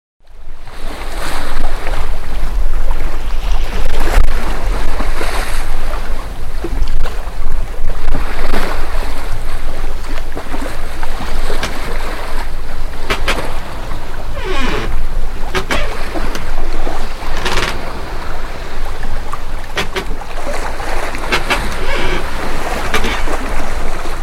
shum morja

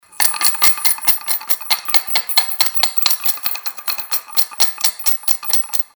zvuk trjaski

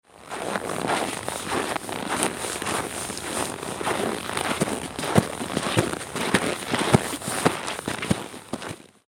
zvuk snega

